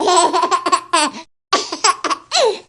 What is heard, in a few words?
baby; Uzukumaru